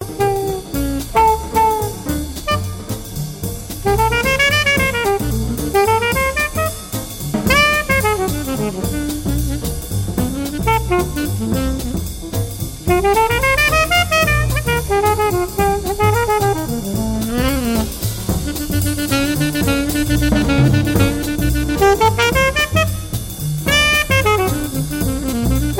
Castilian Drums

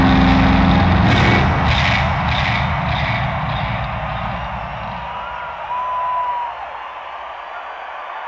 Bass Solo

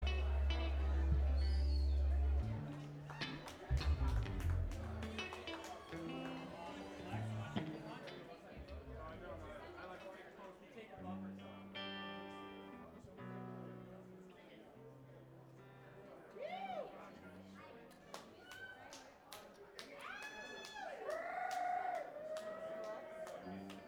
tuning

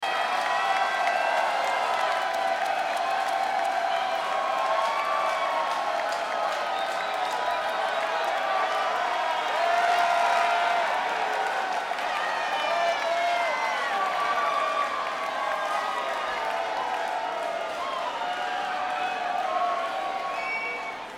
Crowd

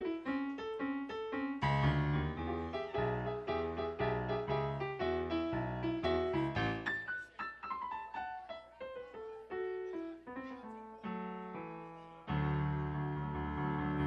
tuning intro

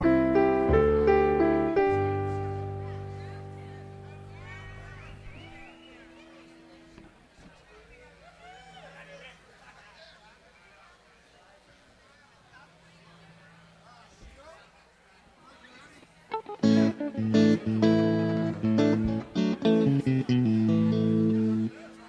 tuning

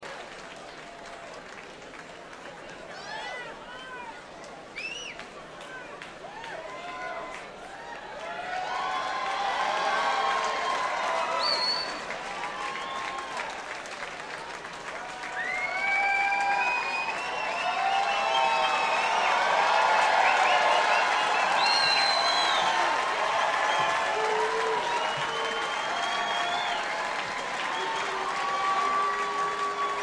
opening applause

